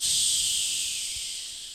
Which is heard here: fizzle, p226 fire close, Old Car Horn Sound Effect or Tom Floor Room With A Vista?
fizzle